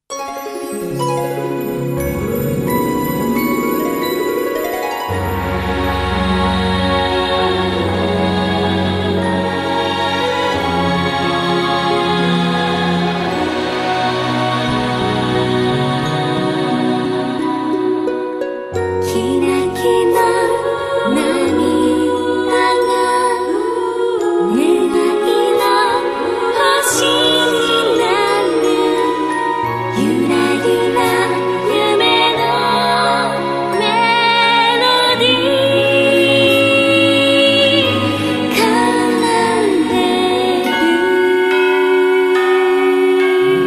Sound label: treasured bell